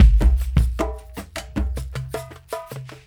Dumbek Grid, Drums; Sonic Foundry (Sony Creative Software); Friendly Fire; Marc Steve

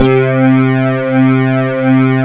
BASS POLY, swing synths; Zero-G - Funky Elements

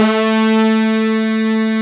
LED, S-LEAD; AKAI S-Series CD-ROM Sound Library VOL-8

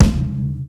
Kick, Drum Hits

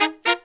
Horns, 110BPM; 90 s Sample CDs; Zero-G; Phantom Horns

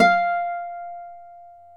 Celtic Harp, Plucked Strings

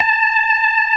ORGAN, NO PERC

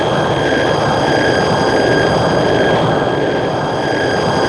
WEIRD BUZZ

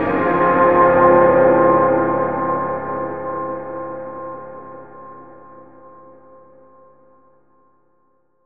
Dark Atmos Pad; Zero-G Sound Sense Grime Scene; 90 s Sample CDs

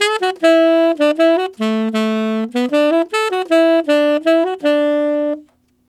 Tenor Polka in D maj, Tenor Sax Loops, Jazz; Sonic Foundry (Sony Creative Software); Jazz Solos and Sections

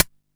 Hat, Drum & Bass; AMG - Prototype Drum & Bass; Hats & Rides

